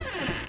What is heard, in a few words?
Door Open; Blockman Go; Android